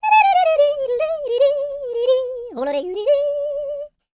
YODEL; ILIO; Vocal Planet

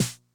Snare Accent Low Tuning Mid Tone Mid Snap, 909; classic machines

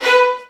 VN FX, Virtuoso Strings

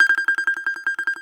BAGLAMA T1GR; E-MU Producer Series Vol. 7 – Old World Instruments

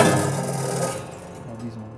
Prepared Piano; Battery 3 Sample Kits

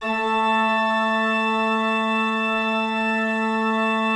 A2, Cathedral Organ; Propeller Island

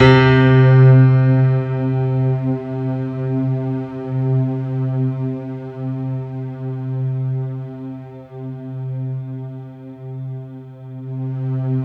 C3, SynthLine; 90 s Sample CDs; NiceBeats; SynthLine VOL-22

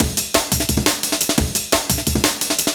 DNB BREAK

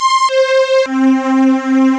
whoo; ST-XX Amiga Soundtracker Sample Packs

